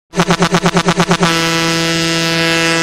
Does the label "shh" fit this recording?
No